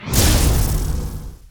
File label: energy debuff; wulingzhu